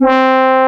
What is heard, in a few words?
FM FLUGEL C3, HORNS; AKAI S-Series CD-ROM Sound Library VOL-2